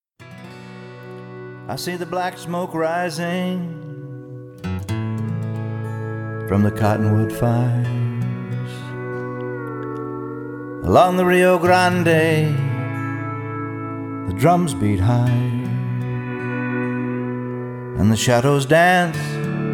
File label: Blues